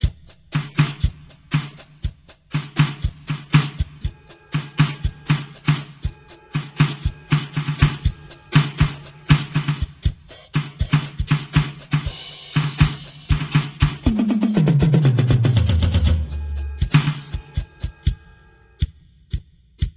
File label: rhythm